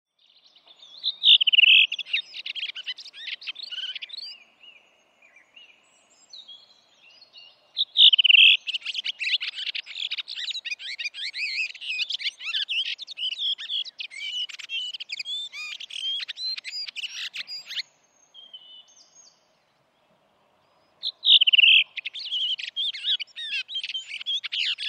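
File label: redwing; säe päättyy kitisevään viserrykseen. Kutsuääni sirahtava ”sriii”; punakylkirastas; birdlife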